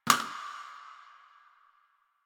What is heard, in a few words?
Janitor Plastic Spray Bottle